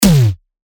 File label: Tom, percussion; G; revealed percussion vol 3